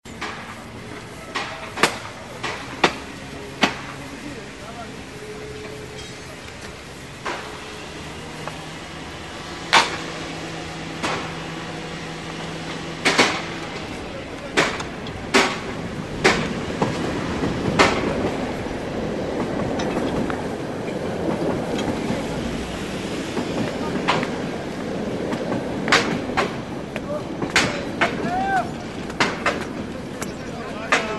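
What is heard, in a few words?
construction and repair sounds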